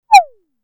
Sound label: Little Bird